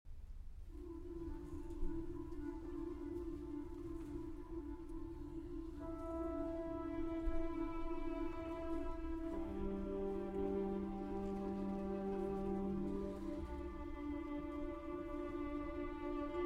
Violin Concerto II. Rounds

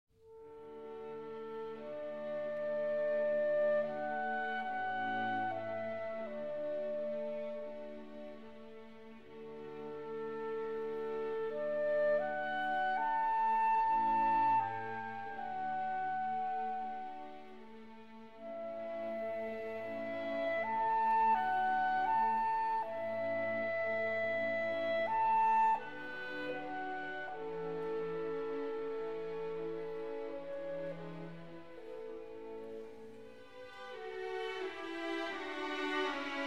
Clarinet Concerto in A Major, Adagio

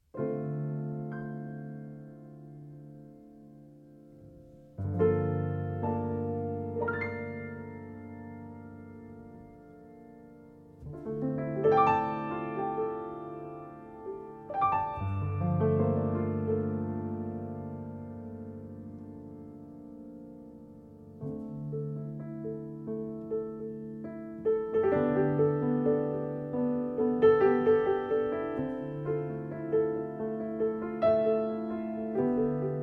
Piano

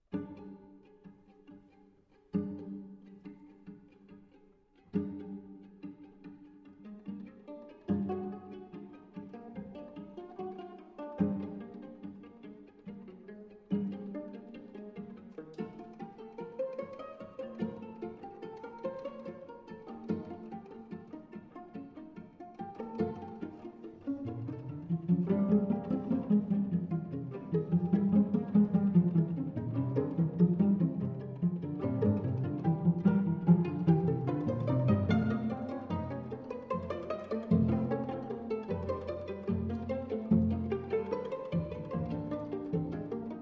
string quartet, pizzicato; String Quartet No. 4 in C; Allegretto

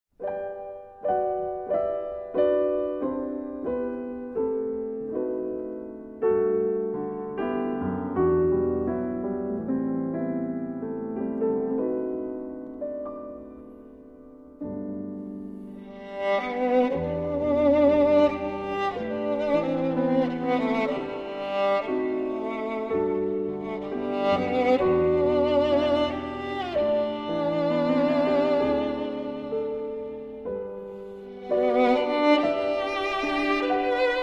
For Violin & Piano; Chen & Leong

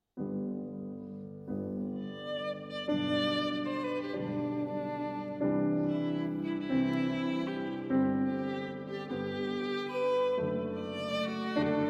Violin Sonata, G Major; Brahms; Violin Sonata No. 1; Op. 78; I. Vivace

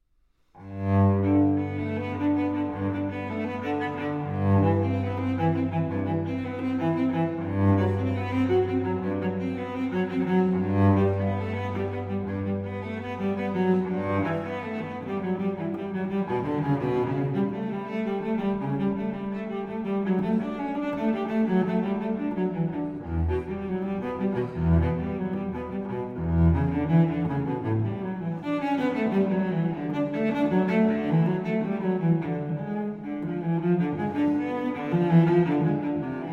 Cello Suite in G Major; J.S. Bach; Cello Suite No. 1; BWV 1007

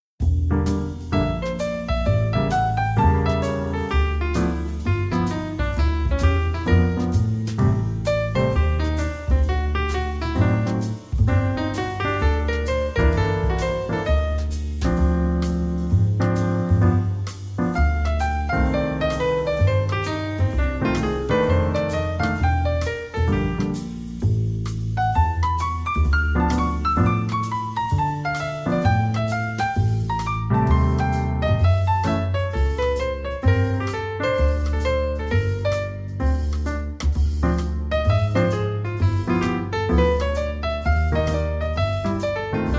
jazz lounge, relaxing music; New York

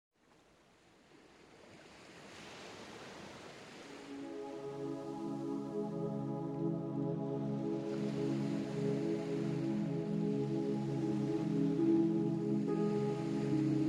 Asian Zen - Ambient Reflection

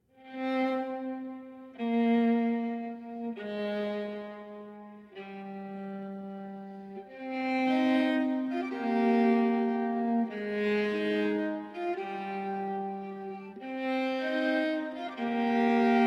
Passacaglia in G Minor (Arr. For Viola)

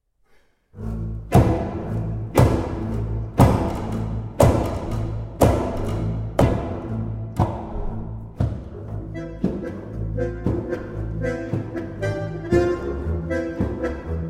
Bandoneon, Violin and Orchestra

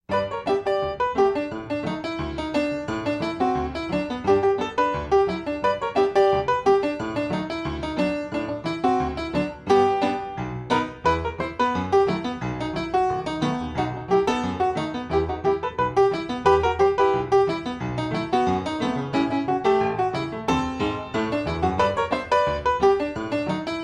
Ragtime Piano

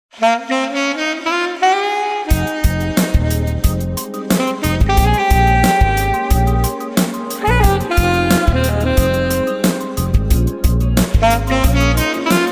Medley Sax Party

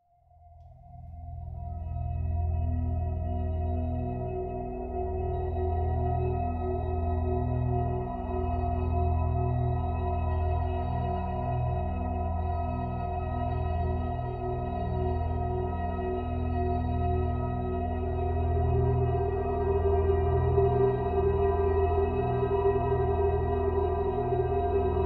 Alternative Drums

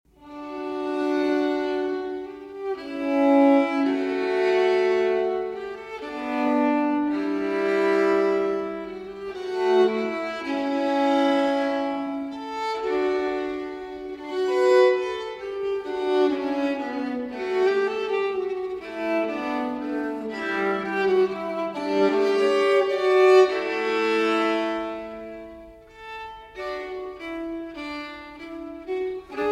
violin solo; J.S. Bach Partita for Violin Solo No. 2 in D Minor, BWV 1004; 5. Chaconne – Pt. 2

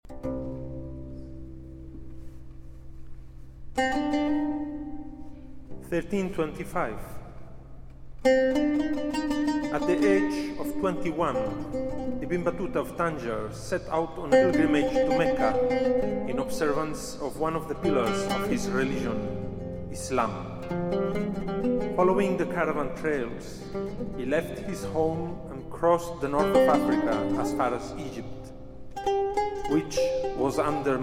Taqsim and Narration

